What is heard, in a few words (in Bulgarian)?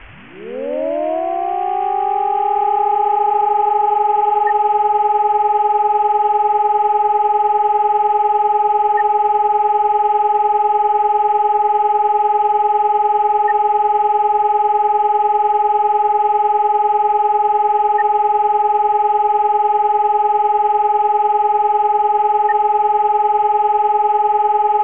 Национален сигнал за край на тревогата; национални сигнали за тревоги